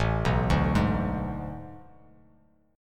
A Major 7th Suspended 2nd Suspended 4th A down Bass Standard; AM7sus2sus4 arpeggiated; JGuitar